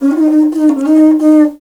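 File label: Afro Horn Phrase; Roland LCDP11 Africa VOL-1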